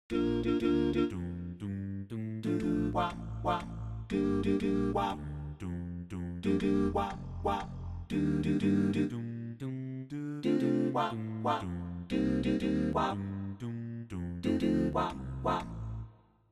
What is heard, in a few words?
doo wop